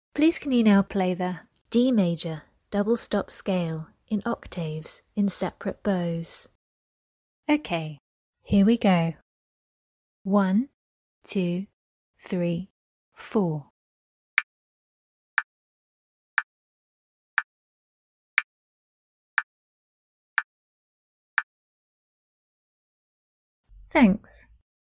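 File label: D major double stop scale octaves separate bows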